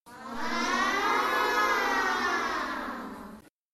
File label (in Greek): ααααα